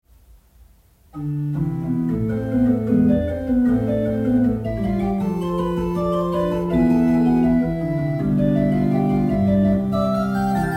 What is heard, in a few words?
Mayer, pipe organ